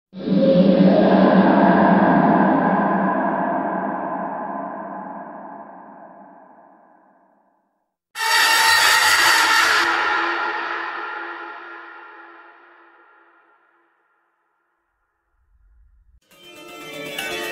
suoni horror sound scary horror effetti sonori suoni spaventosi